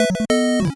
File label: 8bit se